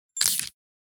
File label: CAMERA SHUTTER